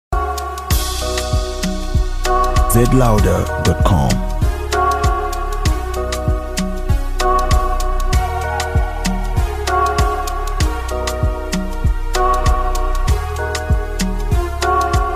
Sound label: fire type beat